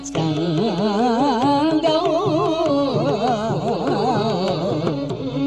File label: Bhairavi Shruti E